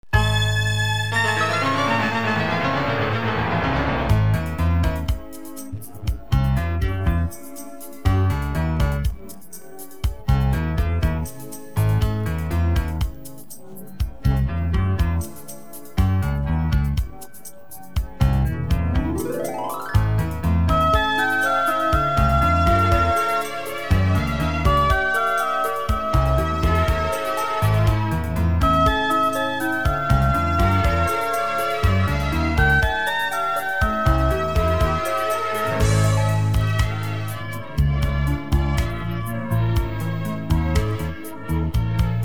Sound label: instrumental, persian